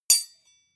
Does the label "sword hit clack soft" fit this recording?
Yes